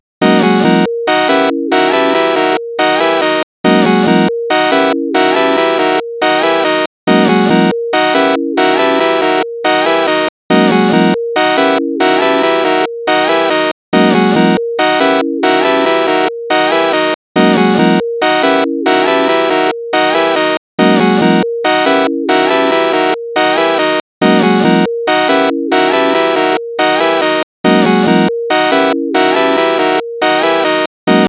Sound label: Beat